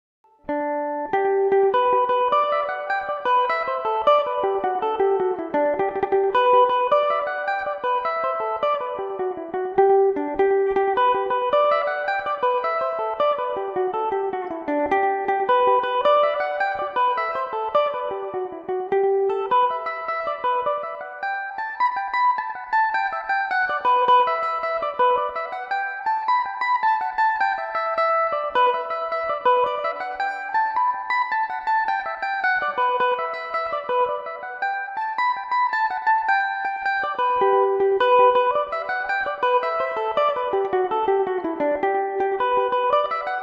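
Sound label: bridal jig G major revelation revisited, mandolin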